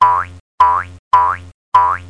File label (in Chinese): 弹